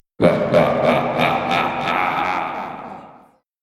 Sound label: Scary Laugh